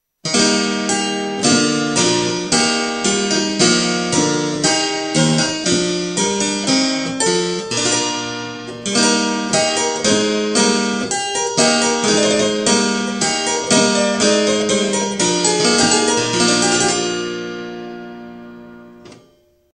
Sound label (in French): instruments epinette